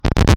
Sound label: Needle scratch